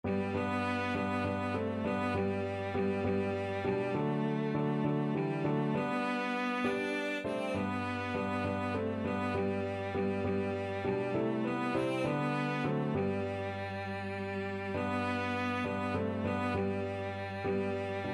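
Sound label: violoncello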